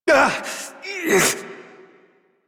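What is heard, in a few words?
Pain, Adult, English; Warframe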